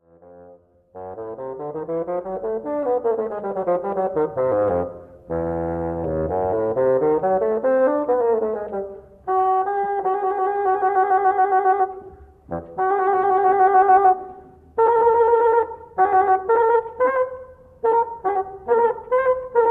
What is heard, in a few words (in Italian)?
fagotto solo